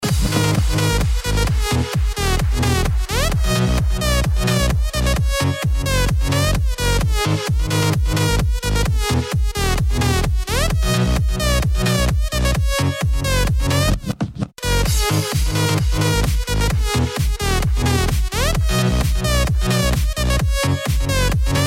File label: Saw Disco